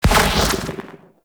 Hand Slip Transference; Tenno; Warframe; The New War; Throne Room

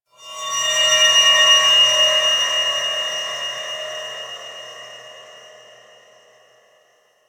High Shimmer; Warframe; Ambience; PVP; CTF Orokin

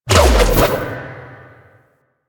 Spin Whoosh; Enemies; Foley; Generic Foley; Warframe; Duviri; Dax

